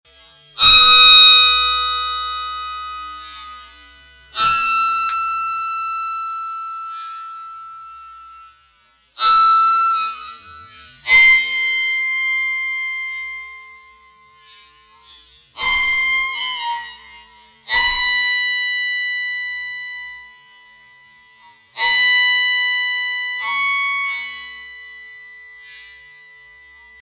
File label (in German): Geisterschloss Klangstueck